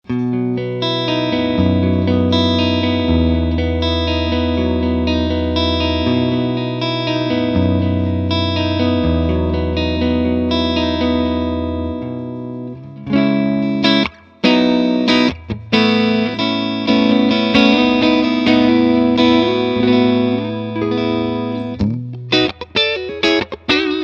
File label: clean; et65; lonestar